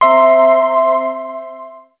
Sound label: Hospital Code Blue